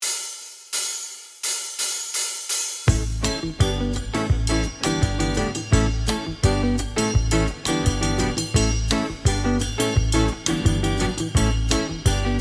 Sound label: Drum Recital